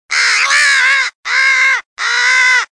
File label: Crow Death Cry